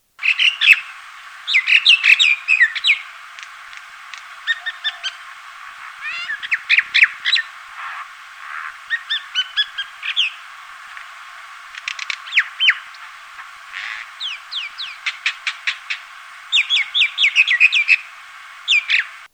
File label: Myna long call, indian myna